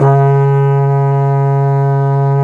C#2 HORN L, Didgeridoo and Other Primitive Instruments; Big Fish Audio; DIDGEHORN1